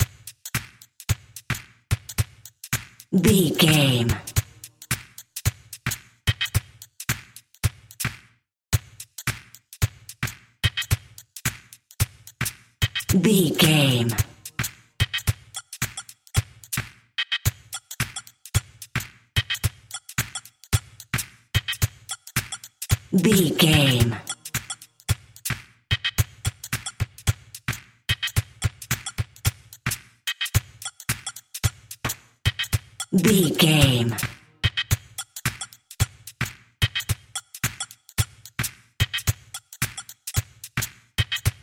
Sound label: happy video game level drums only